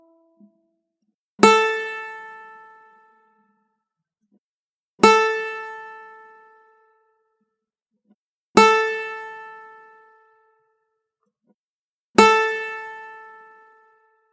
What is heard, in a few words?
Ukulele Tuner A